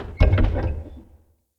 Archway Gate Door Close; UnderCity

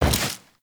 Jump; Characters; Generic Foley

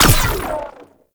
Space Fighter Laser Fire; Warframe; RailJack; Corpus; Space Fighter Laser Agent

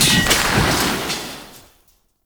Fire Extinguisher Destroy; Ambience; Gameplay; Warframe; Nineteen Ninety Nine

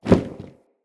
Leather Flap; Ambience; Soul Plane; Vendor

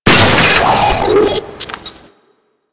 Auto Turret Agent Close; Warframe; Venus Turrets

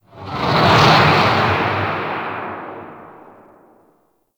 Asteroid Pass, Fortress Ambience; Warframe; Grineer

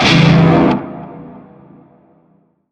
Shawzin Phrygian Chord; Warframe; Narmer Shawzin

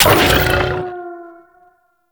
Quad Sniper Mech; Warframe; Tenno

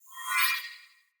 Sword Whoosh Accent Heavy; Fey Sword

